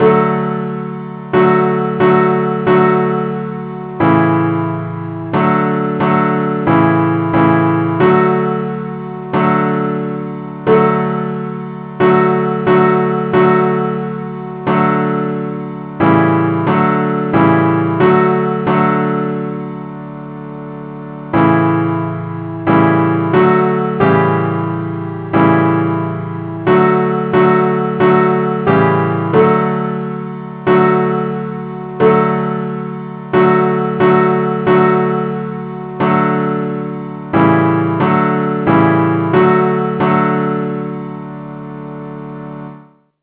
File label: simple acc key D